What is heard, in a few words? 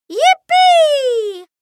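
"yippee" female; Смешные и комичные звуковые эффекты для детских видео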